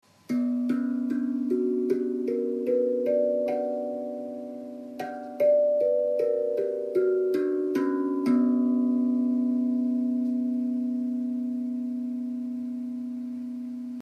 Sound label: Zenko Solstice Hand